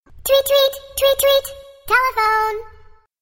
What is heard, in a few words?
Twit twit telephone